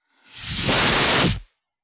Short Heavy Fire Exti, fire extinguisher

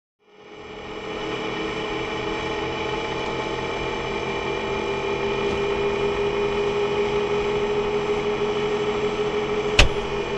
Electric Motor Small

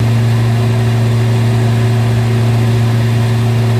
Fan Exhaust Run Loop, fans big; Fan Exhaust Running Loop

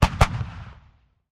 155mm howitzer

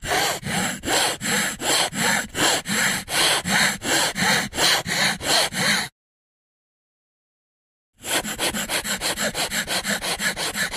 Instruments Hacksaw

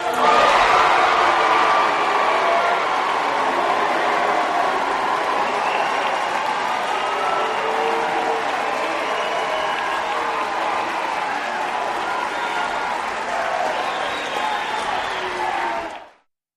Crowd Yeah Boo Yeah, big arena

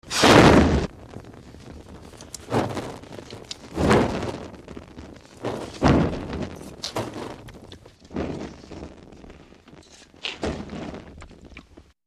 Chemical Flame Flares Up As Wind Blows On Fuel

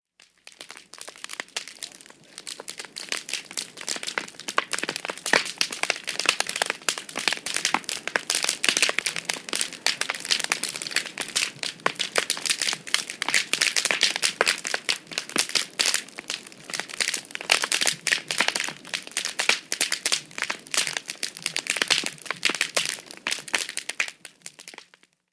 Fireplace Very Crack, fireplace exterior

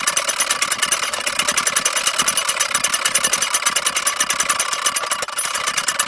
Roto Mower Old Style

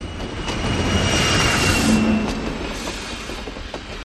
Diesel Loco Pass By

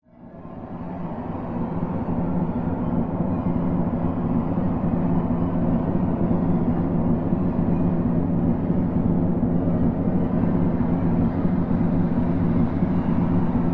Long Hollow Wind Tun

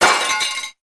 Glass Breaks Light; windows & mirrors

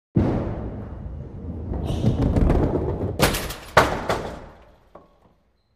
Bowling Ball Rolls

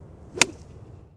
Golf Swing Hit

